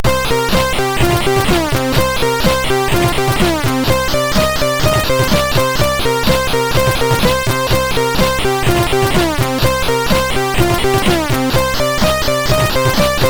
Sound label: sid MOS 6581